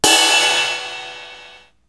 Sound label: RX5 RIDE; Hollywood Edge; The Big Bad Beatmachine